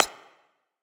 clap; smite; osiris

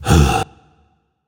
nv sigh; smite; zhongkui

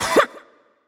grunt attack; smite; izanami; spades

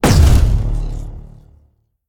atlas throw; smite; god atlas

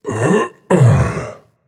grunt lift; smite; osiris

